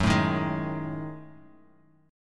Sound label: Gb Minor 6th Add 9th Gb down; strummed; JGuitar